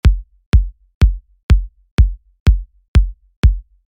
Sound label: Kick Loops